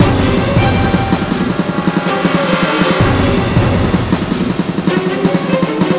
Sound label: loop